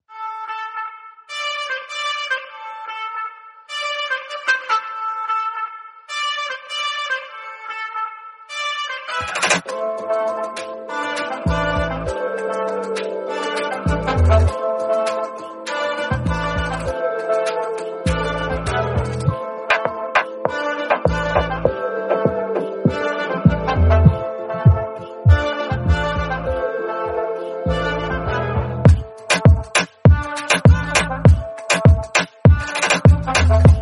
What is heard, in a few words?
instrumental song 8d audio